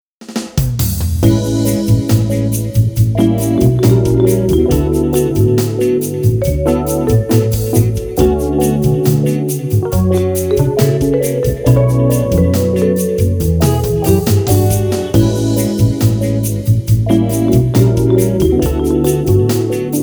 cubic cafe jazzy mix; since memories off the starry sky

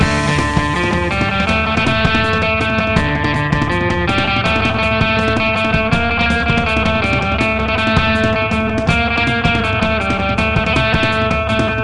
Music Beach; Beach Buggy Blitz; Android

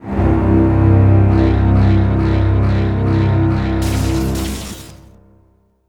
bounty lose; Kill to Collect; windows gamerip 2016

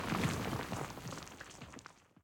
Waste Event Fall

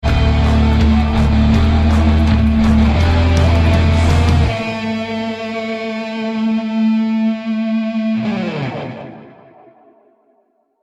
Music mission Failed

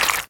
Impact Flesh Little

